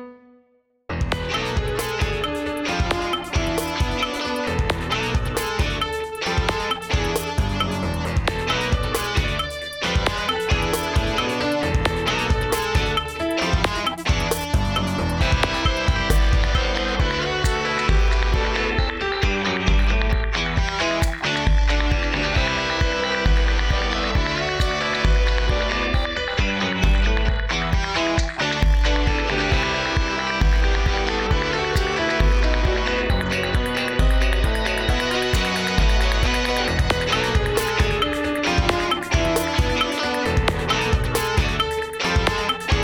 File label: guitar twin 134bpm